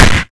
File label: hit